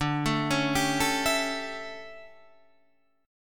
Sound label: D Major 7th Suspended 2nd Suspended 4th D down Guitar Standard; DM7sus2sus4; arpeggiated; JGuitar